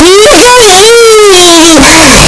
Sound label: pikachu cry; pokeyellow